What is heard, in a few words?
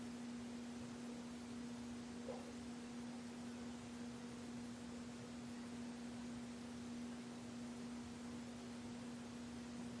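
Ballroom Static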